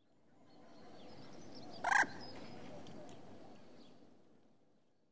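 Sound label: Scottish grouse makes a sound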